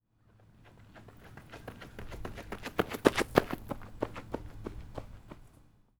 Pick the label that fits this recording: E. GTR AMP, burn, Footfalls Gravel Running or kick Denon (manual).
Footfalls Gravel Running